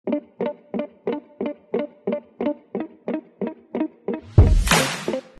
Energetic Tune In Intro

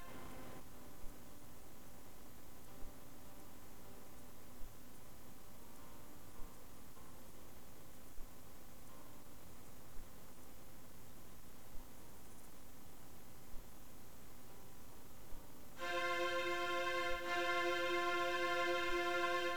STRINGS Recording

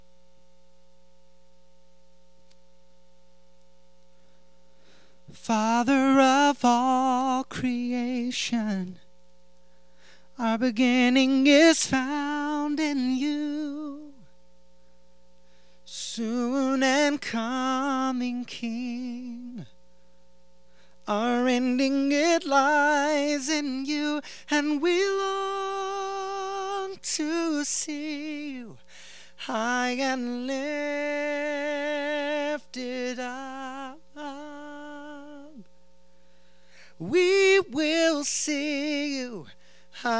Tenor Recording

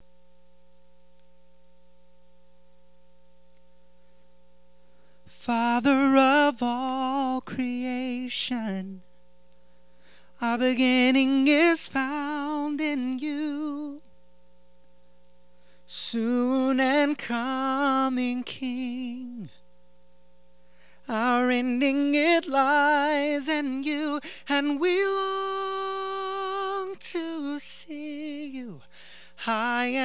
Tenor Recording

